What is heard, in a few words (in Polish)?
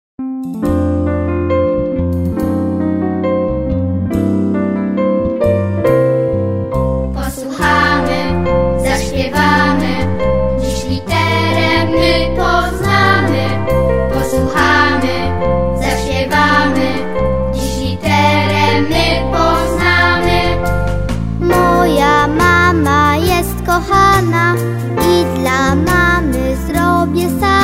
piosenka litera m; szkola marzen na tak; klasa 1